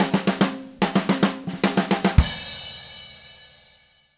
Red Maple Groove 110, DW Red Maple Kit

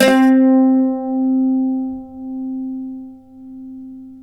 c+3, Mandolin; Guitar; Terkelsen

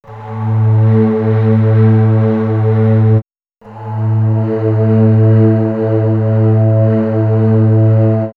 Bamboo Flute; X-Static Goldmine; backtothe70's

